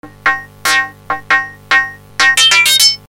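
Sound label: hammer, waldorf synth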